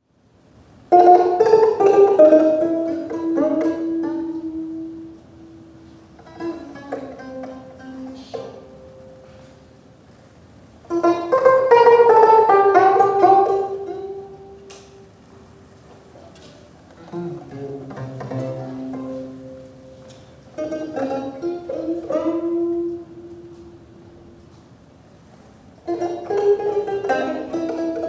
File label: gayageum poems; Unaccompanied Sanjo Gayageum (Korean plucked string)